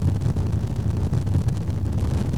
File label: fireball loop; DoomRTX; imp fireball